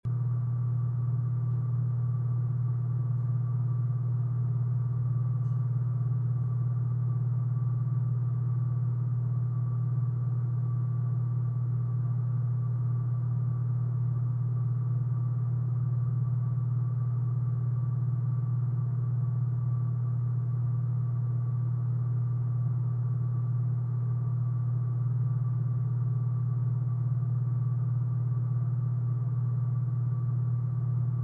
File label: ambient table tennis room; tt arena